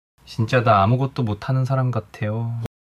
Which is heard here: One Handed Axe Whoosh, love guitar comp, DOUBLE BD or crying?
crying